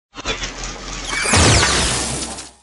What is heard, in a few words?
Emote Surprise; Sparky